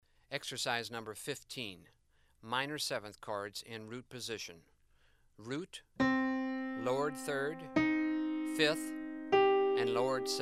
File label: Min 7th Chords Root Position Random Root Movement